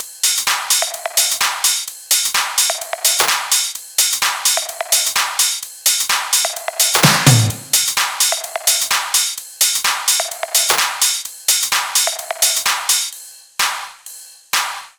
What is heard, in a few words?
Loops 128BPM, Drumloops